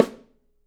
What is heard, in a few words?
Drums, MARCHING; 3D Drums; OP MARCHING